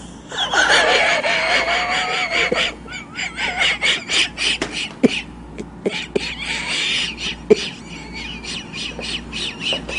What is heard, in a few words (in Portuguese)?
risada de fumante